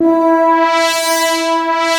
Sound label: FILT SWEEPAD; POP STRINGS